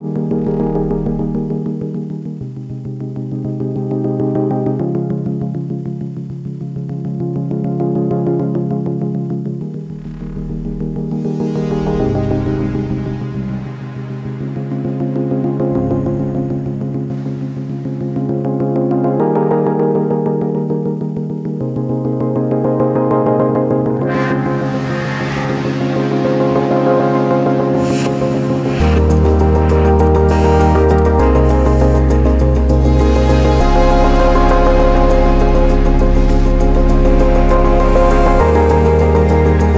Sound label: Music Only